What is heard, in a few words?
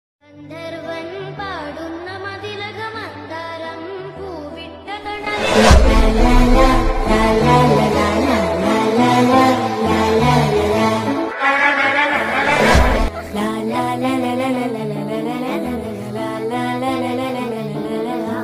bgm ringtone song